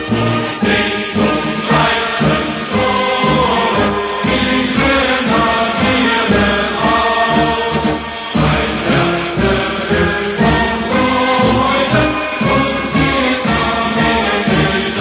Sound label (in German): vaterland; wbsounds